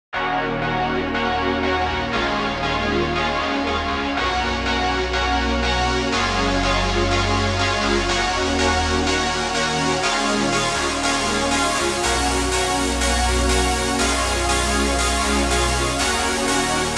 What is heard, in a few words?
Poly Synth; dexibell vivo s2